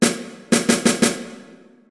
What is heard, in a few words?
Lab rythm